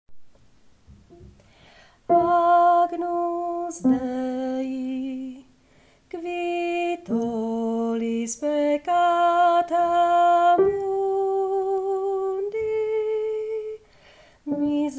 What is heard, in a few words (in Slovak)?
bas; Agnus Dei; spevokol